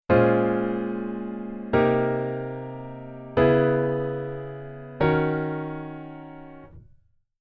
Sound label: Jazz Waltz Chord Chorus